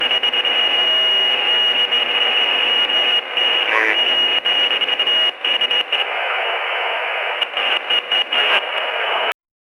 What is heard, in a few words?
cb radio